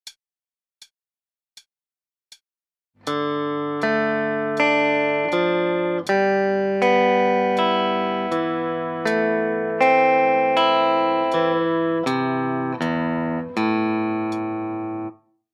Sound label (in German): Arpeggio, E-Gitarre; ARPEGGIO-SPIELWEISE in anfängergerechtem Tempo